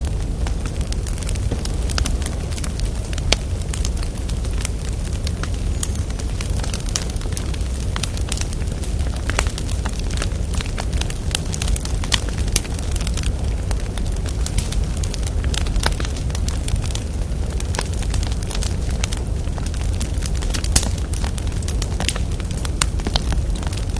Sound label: sky fire; cs2; fakk